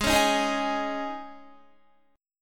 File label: Ab Suspended 4th, Guitar; strummed; Absus4